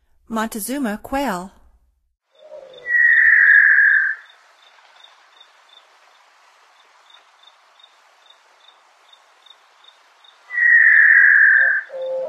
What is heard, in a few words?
Montezuma Quail; North America; Stokes